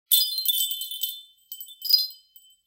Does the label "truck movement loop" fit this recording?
No